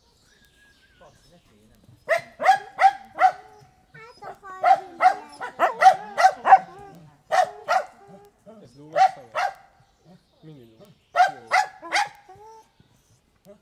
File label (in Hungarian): és a látogatók is, mudi; szegedi zoo 2011